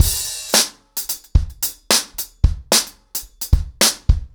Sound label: 110BPM, acoustic drums, drumloops